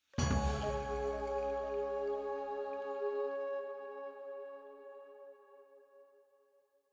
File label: item Jingle Full+ Choir Flute C sharp